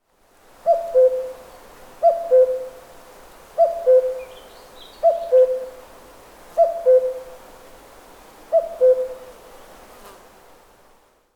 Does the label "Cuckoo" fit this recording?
Yes